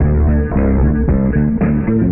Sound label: guitar